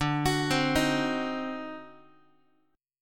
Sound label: D 7th Flat 9th, Guitar; 4A,4E,5A,5D,5G#,6C#; arpeggiated; JGuitar